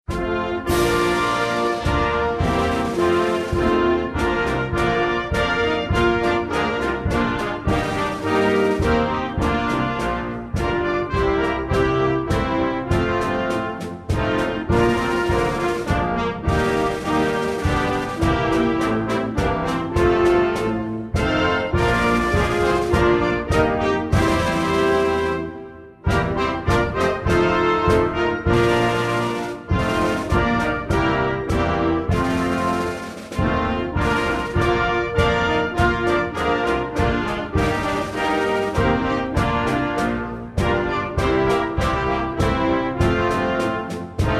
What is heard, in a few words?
anthem